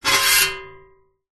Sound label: metal scrape blade ceiling fan; foley